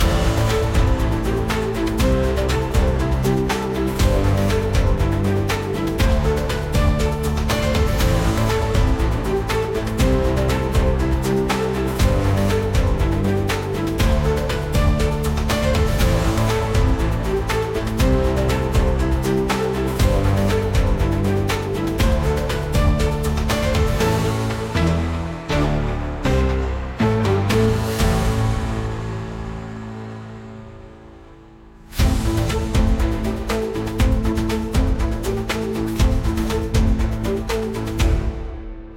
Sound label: Cozy Character Creation